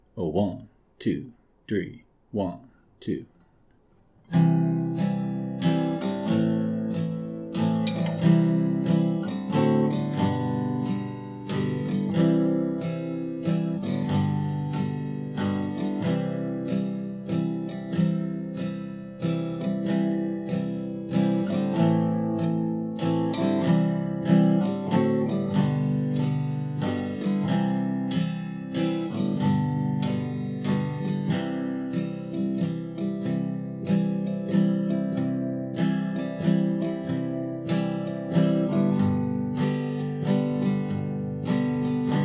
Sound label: star spangled banner backing track c major